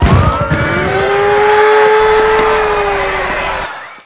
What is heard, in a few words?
city